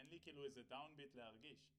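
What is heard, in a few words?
SNR Top, snare top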